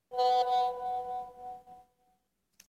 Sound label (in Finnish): whatsapp ilmoitus kuuluu